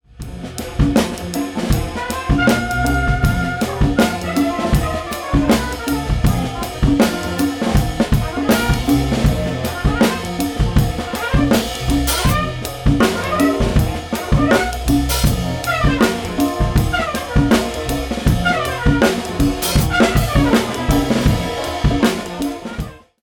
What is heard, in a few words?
Overhead; Steinberg SpectraLayers Pro 10